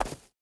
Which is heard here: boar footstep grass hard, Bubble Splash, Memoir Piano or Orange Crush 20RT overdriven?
boar footstep grass hard